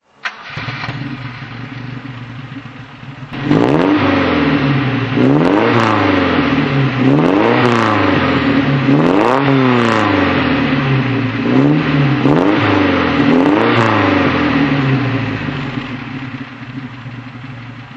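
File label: alfa romeo 1750 gtv throttle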